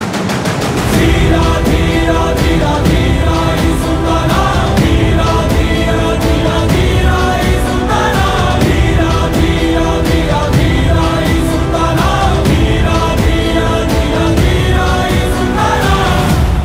Humming Ringtone

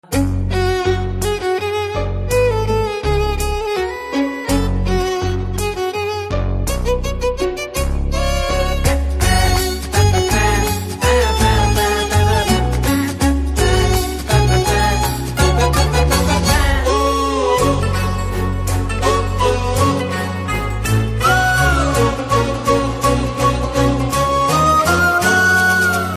Bul Bul Tarang BGM

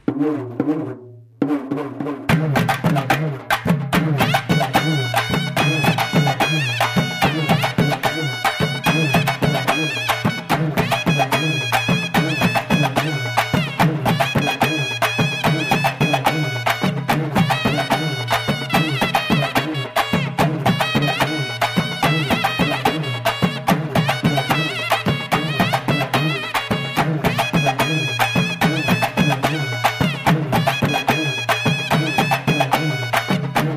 Happy End Bgm

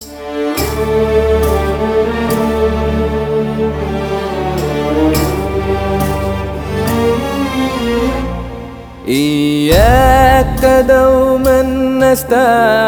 Arabi Song

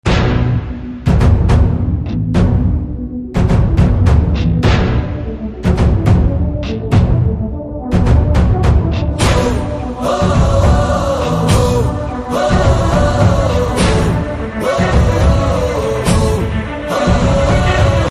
BG Beats Tone

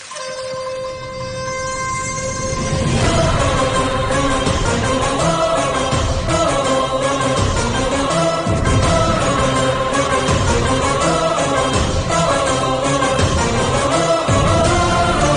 BGM

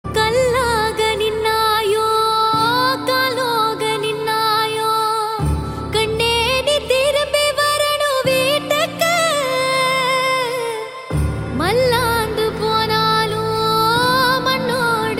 Sad Ringtone

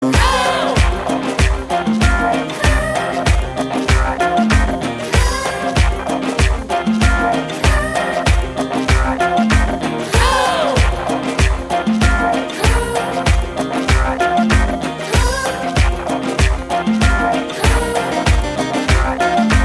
Humming Bgm

